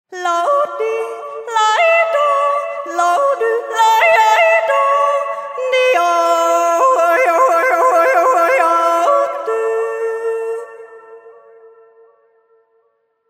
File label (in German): Jodler; Harzer